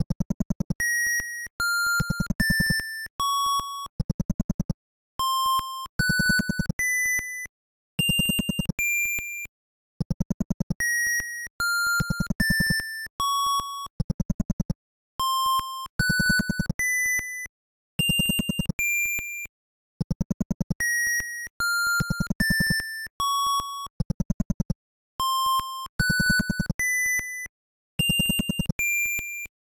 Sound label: Metroid Spacey; NINTENDO; NES RINGTONE PACK